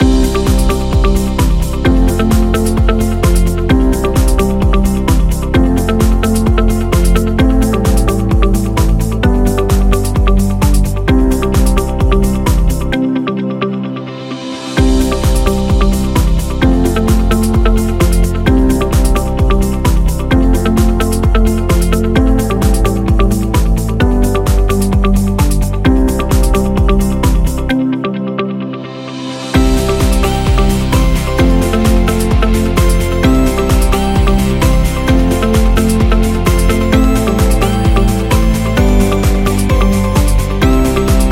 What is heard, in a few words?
Podcast Loop